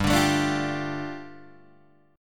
G 7th Suspended 2nd G, Guitar Open D

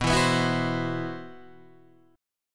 C Major 7th Flat 5th B

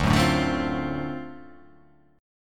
D 7th Sharp 9th D down, chord; D7#9 strummed; JGuitar

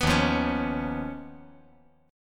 Eb 7th Suspended 2nd Sharp 5th Eb; 4,3,1,4; Eb7sus2#5 strummed; JGuitar

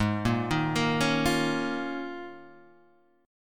Eb 7th Suspended 2nd Suspended 4th Ab

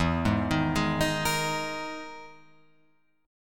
E Major 7th Flat 5th, Guitar, Open F, EM7b5 chord; arpeggiated; 4,3,3,3,6,5; JGuitar

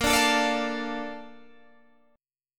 A sharp Major 7th, Guitar DADGAD

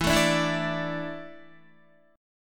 E 7th Suspended 4th, Guitar; E7sus4; strummed; 12,12,12,9,9,9

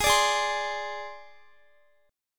G sharp Major Flat 5th, Guitar; G#Mb5 Chord

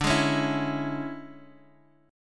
C sharp Minor D; C#m/D; strummed; JGuitar

